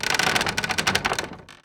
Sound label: window; ambient; inferno